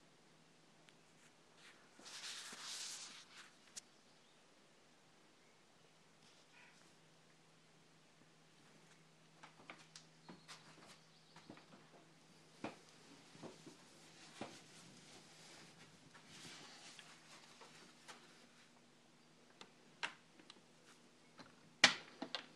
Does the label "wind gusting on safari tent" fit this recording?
Yes